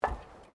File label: FOOTSTEPS WOMENS LOW HEELS ESCALATOR STEP; Footstep Foley; Human